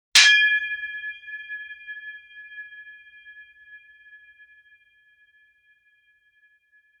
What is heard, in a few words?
cinematic sword clang extended